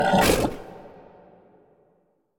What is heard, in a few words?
Pig snort